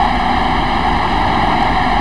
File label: Run, Train; OpenBVE